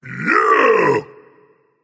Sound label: nv yay; Smite; ymir skin14